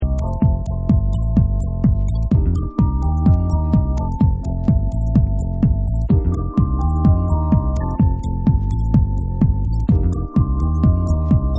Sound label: Vocal